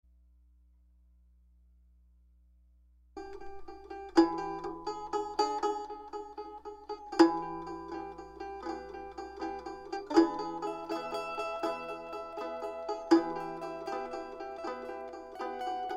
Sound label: minute E in 3 Banjo